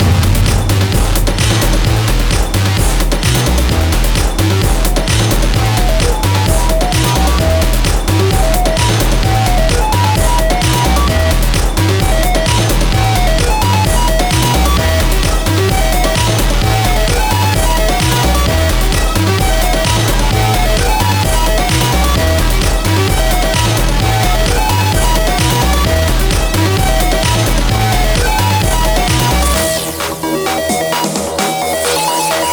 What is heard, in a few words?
Industrial; Industrial Strength Dodgeballs; metagalactic blitz